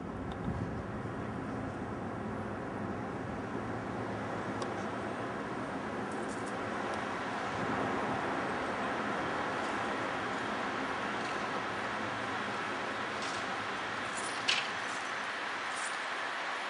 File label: whitethroat